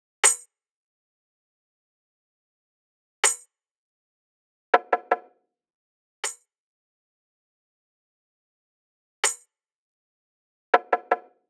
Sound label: Drum Kit, Percussion, 160, Trap; Stems; Achieve Drum Kit